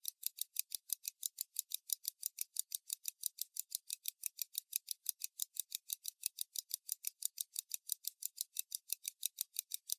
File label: Pocket Watch Tick Hand Lid Close